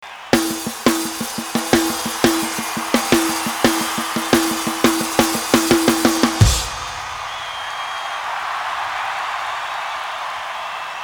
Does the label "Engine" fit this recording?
No